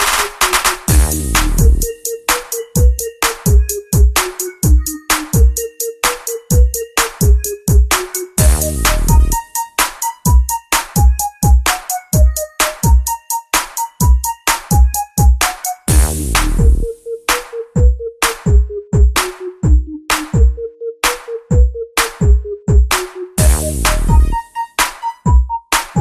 norwegian tune ringtone

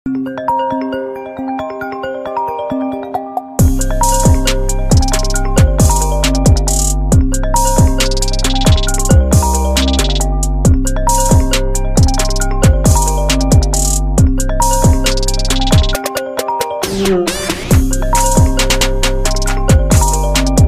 iphone ringtone

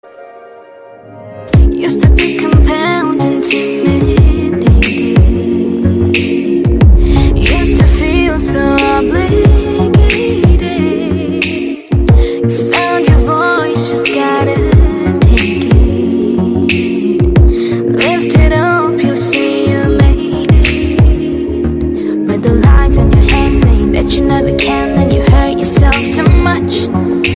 english ringtone

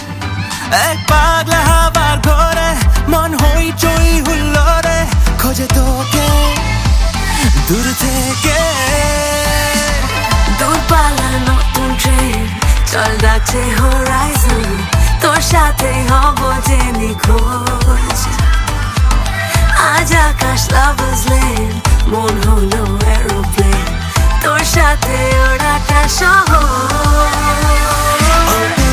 bengali ringtone

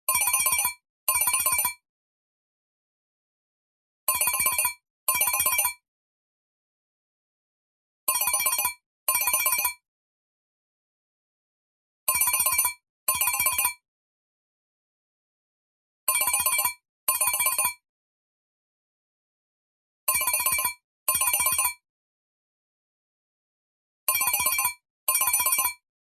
apple quick bell ringtone

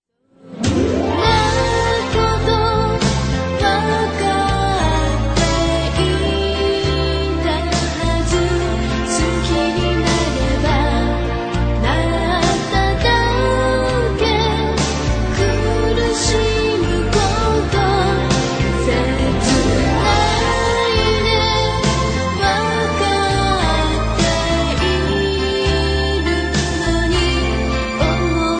japanese ringtones

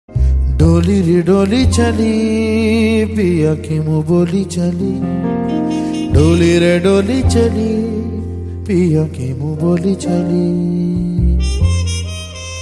desi song ringtone

